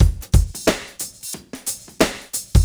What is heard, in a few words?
Roland SR-JV80-12 Swingin 90bpm